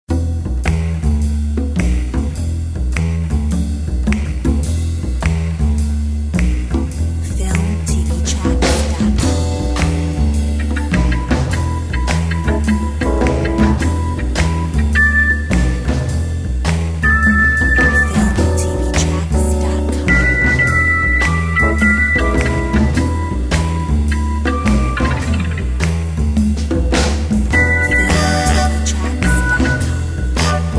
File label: Smoky jazz